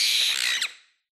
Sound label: Bird DOT; smite 2; Aphrodite